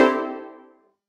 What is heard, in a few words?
milestone